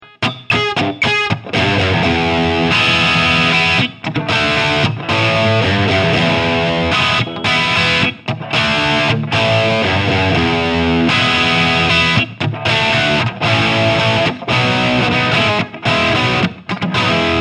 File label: Blue Channel; Friedman BE